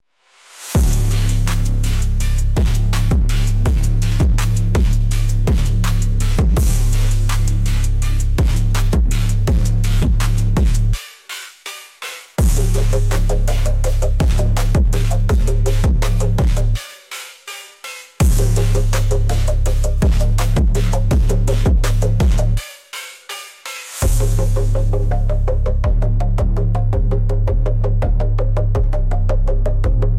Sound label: vinyl vibes, phonk